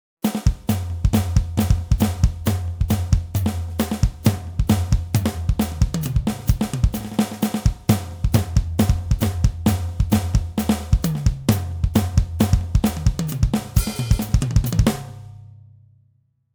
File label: Birch Custom